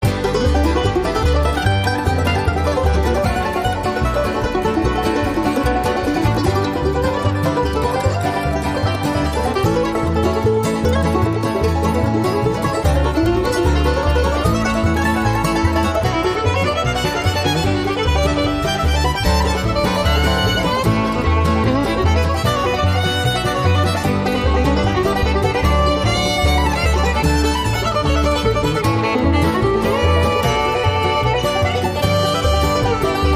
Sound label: Bluegrass Pickin Party